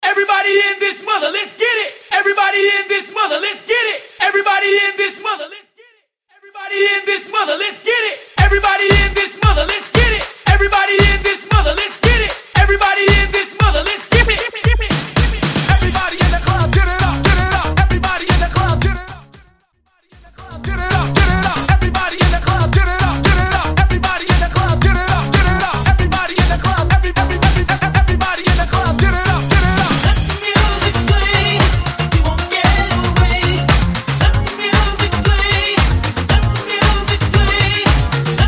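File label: 80s Dance Mainstream Acapella In Hype Re-Drum; Mainstream VIP; Vegas Edit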